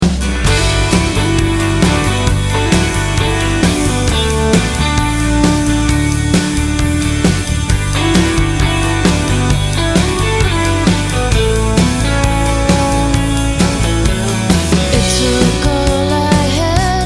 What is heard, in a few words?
crying